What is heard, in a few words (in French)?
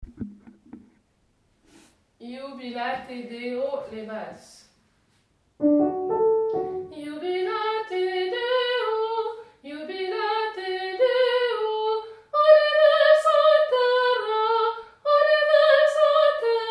jubilate deo basse